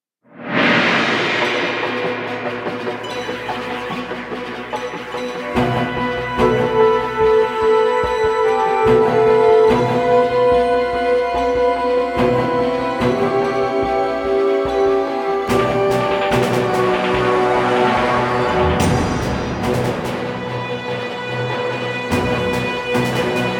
Combat Dogfight; Elite Dangerous

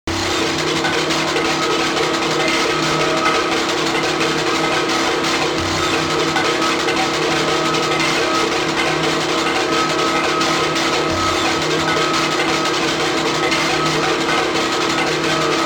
Radio

